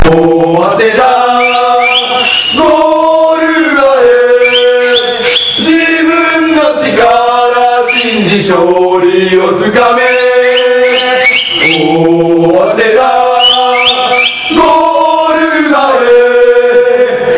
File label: goal